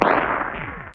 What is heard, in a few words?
thump spinner shot; gonner linux macos ps4 switch windows xbox one gamerip 2016